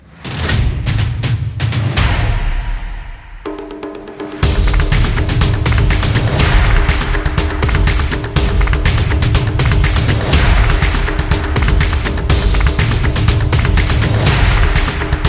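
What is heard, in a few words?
Sport Energetic, Football percussion background music inspired by samba rhythm, stadium energy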